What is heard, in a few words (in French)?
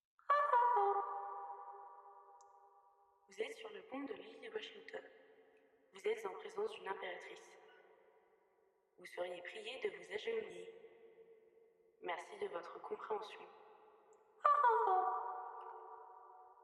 Sonorité naturelle C Majeur